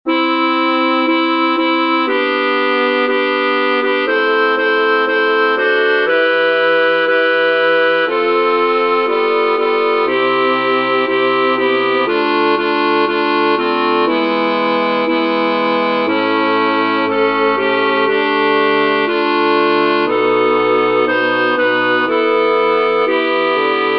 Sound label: tutti; Concert du 13 décembre 2024 en l'Église St Pierre - St Paul